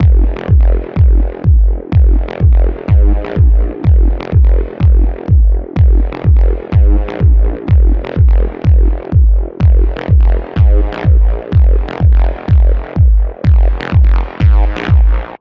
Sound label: Synth Loop Ab 125bpm